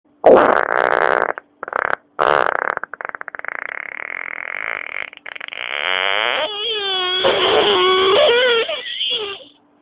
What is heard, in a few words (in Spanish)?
Final estridente, pedo